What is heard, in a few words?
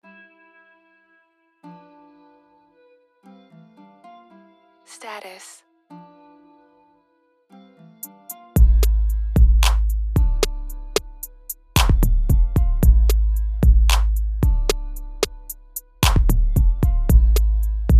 hard bass